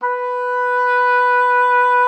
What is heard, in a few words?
B4, OB D.AMORE; Xsample VOL-7